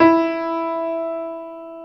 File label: E3, GRAND PIANO; InVision Interactive; Lightware VOL-1; Instruments & Percussions